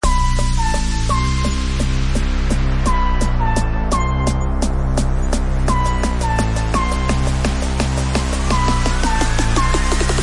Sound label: Gangsters hip hop beat